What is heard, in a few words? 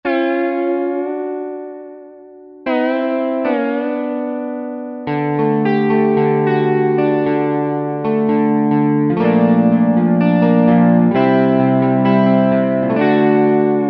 FEND ELECT, American Heartland; Sonic Reality American Heartland